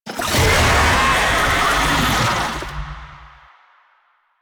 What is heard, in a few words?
Infested Oni Vocal Player Spotted; Warframe